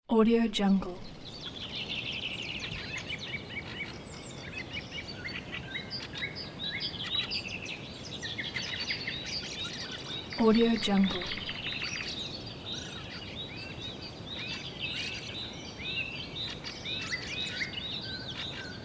spring forest with birds ambience

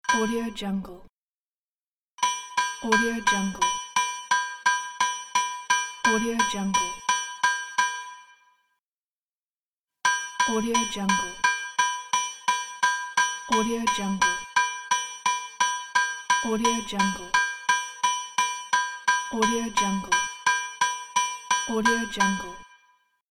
train bell

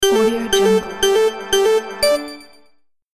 countdown variation

